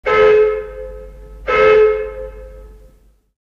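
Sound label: steam locomotive whistle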